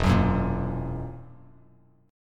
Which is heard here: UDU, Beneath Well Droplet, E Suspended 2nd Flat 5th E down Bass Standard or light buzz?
E Suspended 2nd Flat 5th E down Bass Standard